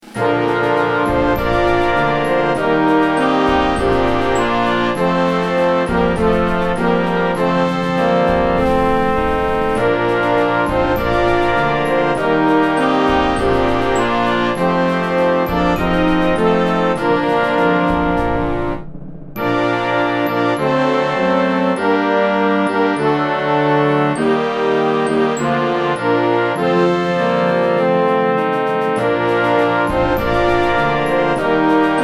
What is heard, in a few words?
concert band; alma mater